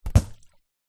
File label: leisure inflated beach ball bounce water